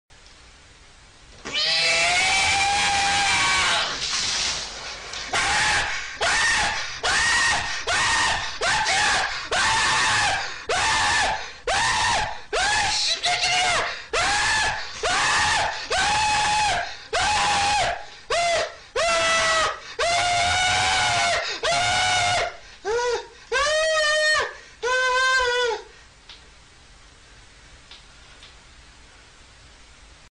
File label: bursting balloon and Screaming; Fat korean